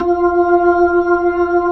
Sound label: B-3 Jazz, keyboards; Keyboards of The 60's and 70's